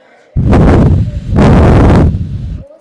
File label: wind blowing